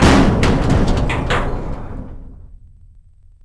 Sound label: Metal Ship Hit; Civ4 beyond sword